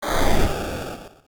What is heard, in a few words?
Fire